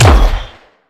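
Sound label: Sci weapon Shot Medium Gun Assault Rifle; Robobeat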